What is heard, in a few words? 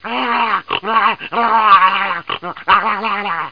DOG, BEACH; PARASOL